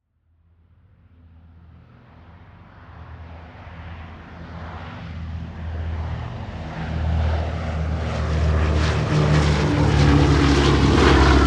Airplane B-17, Transportation, Airplane, B-17, Bomber, Pass, By, Military; Airplane Sound Effects; BlastWave